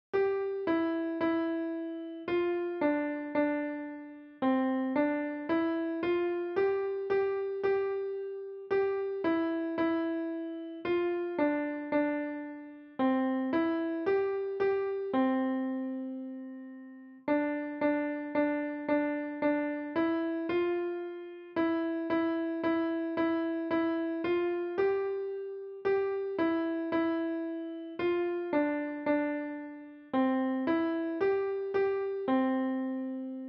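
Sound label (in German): Hänschen klein, Klavier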